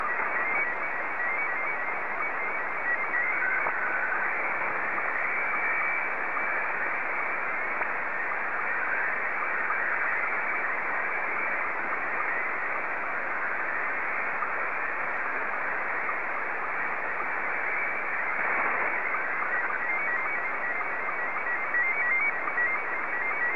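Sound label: Scottie 2, slow-scan television; SSTV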